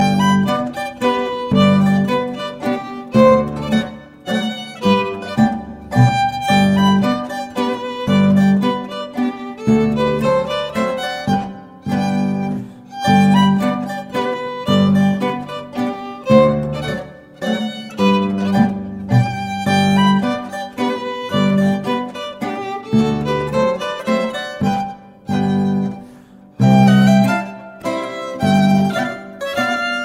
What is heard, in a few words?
Mazurka, zither